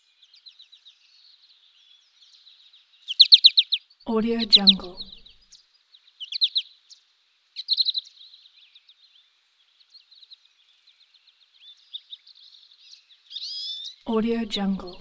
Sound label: goldfinch bird chirps; hollywoodedge